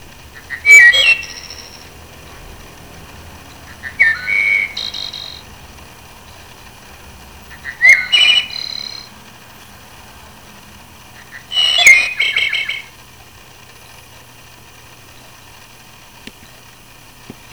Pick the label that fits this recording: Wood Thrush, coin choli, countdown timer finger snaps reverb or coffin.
Wood Thrush